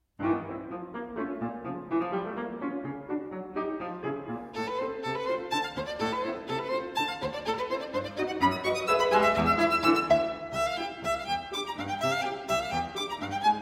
Violin Sonata in D Major, Scherzo Presto

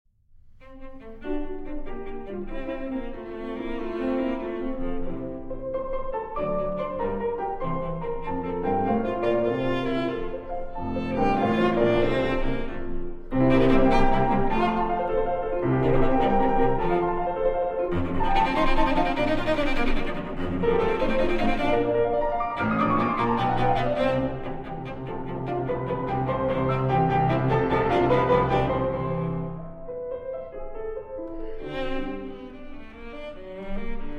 Cello Sonata No. 1 in F Major; Beethoven; Op. 5 No. 1

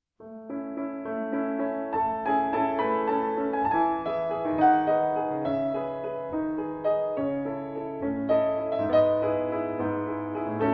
Solo Piano

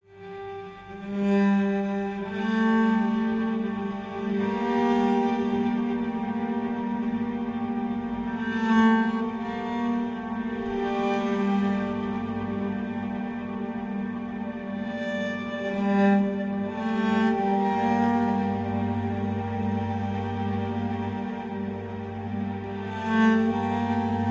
Cello Ambient Improvisation

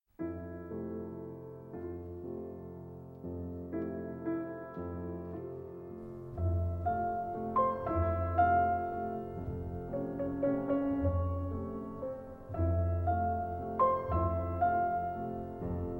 River Waltz

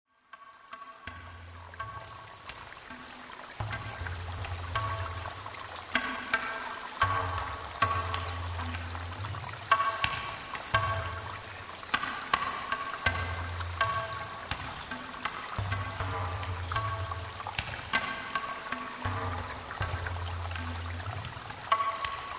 Instrumental Nature Sounds

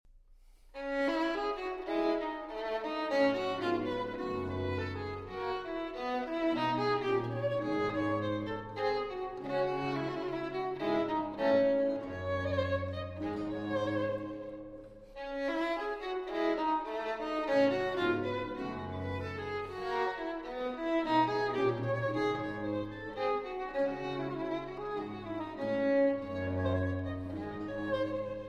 Violin Sonata in D Minor, Allegro

